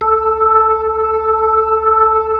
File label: B-3